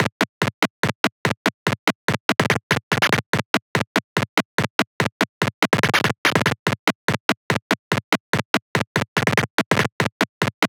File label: 144bpm Drums